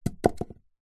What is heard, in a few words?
cardboard tube toilet roll drop carpet; zapsplat